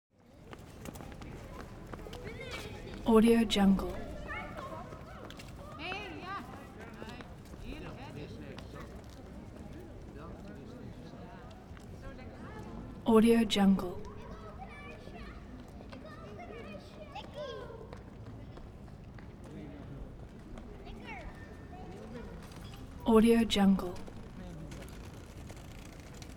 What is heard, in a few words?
atmo urban square europe day kids